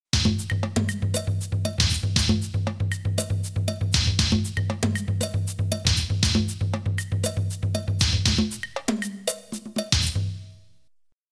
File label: Funk Perc; macbeth soundtrack